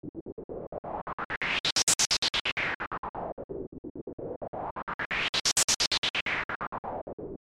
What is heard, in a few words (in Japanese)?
ノイズスイープ・トランスゲート